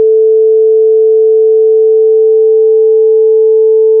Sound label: masking